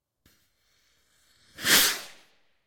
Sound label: comedy whoosh